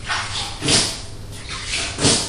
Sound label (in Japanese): mizu kake